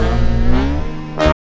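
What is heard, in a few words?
horn chord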